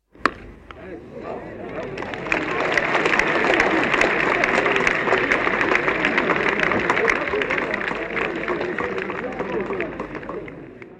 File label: cricket; BOMBAY